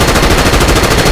Assault Rifle Shot Loop; SFX Kit; Weapons

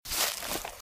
Footsteps - Bush walk - Right; Eden; RadwinIsland

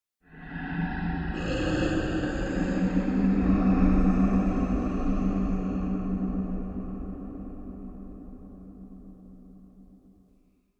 Demon Growl Hover - Zombie Breath; Hollywood Edge; sound designer's toolkit v2

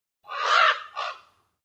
Raven; Inquisitor 3

